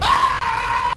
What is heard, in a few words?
ahhh